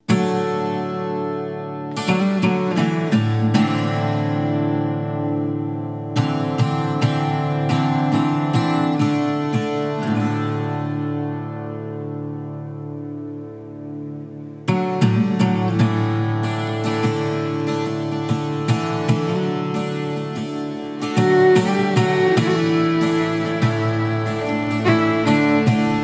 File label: Celtic Dream